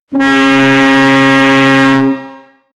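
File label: lighter fantasy horn, ship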